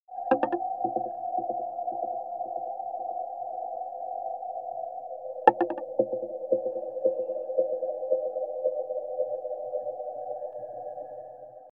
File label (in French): harp dyn aiguillage